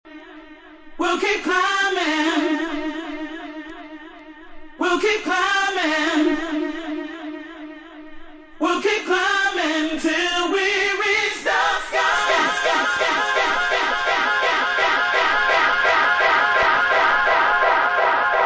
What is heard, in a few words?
acapella